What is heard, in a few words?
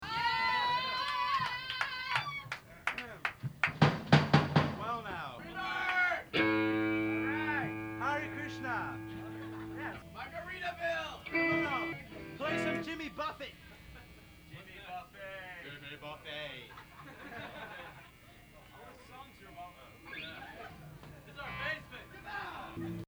Crowd